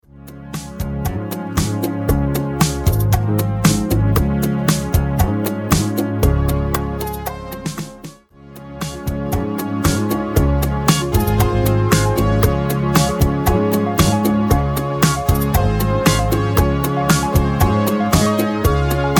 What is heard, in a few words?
Bachata Groove